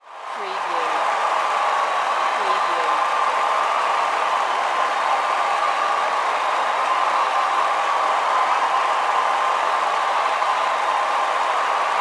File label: Sports Stadium Crowd Cheer Applause Long Close